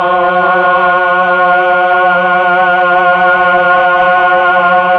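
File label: VOX Tron Choir F#4, Mellotron Vox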